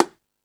Latin Layers, Drum Machines E-MU Pro-Cussion

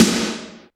Roland R-5 Reverb Snare

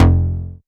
Bass, Synths, 5U Modular; Polyend; Simulacrum Sounds; 1 Shots

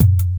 Drum & Bass; Best Service ProSamples vol.03 - Drum & Bass 1; AKAI

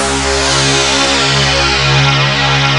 PPG WAVE, OLD SYNTHS

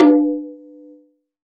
Sime Taiko, Percussion; Roland BK-5

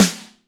HR16 SD; Crypton - DrumBase5000

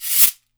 CABASA, Brazil Drum Perc; E-MU Producer Series Vol. 7 – Old World Instruments

